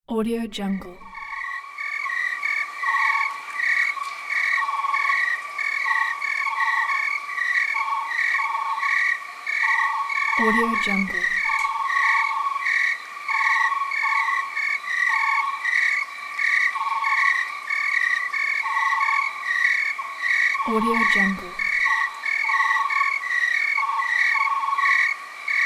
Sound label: crickets chorus